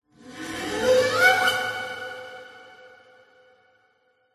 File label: horror accent scrape screech guitar